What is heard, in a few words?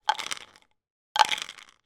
medical vitamin tablets x2 in plastic pot movements